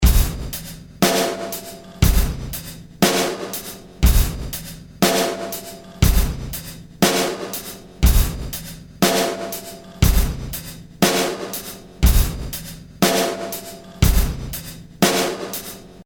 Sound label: BPM60